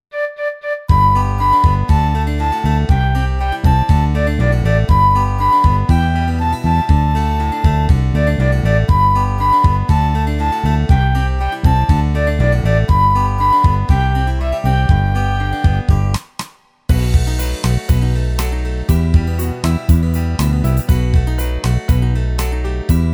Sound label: Karaoke